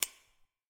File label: Lamp Off Cage; Game rip; MiSide